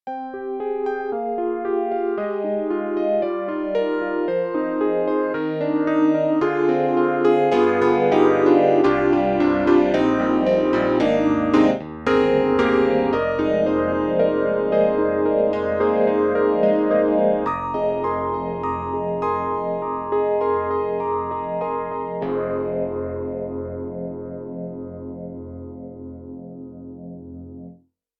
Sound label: Yamaha CP80 Phaser Wide Phaser; Steinberg; The Grand 3